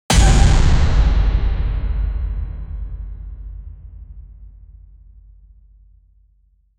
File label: fighting game hit